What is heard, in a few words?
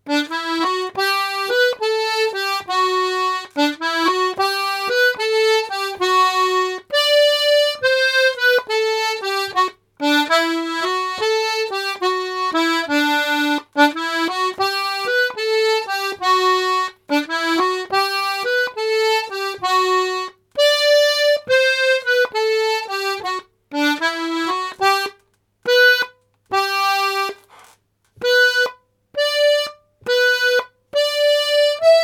College Hornpipe slow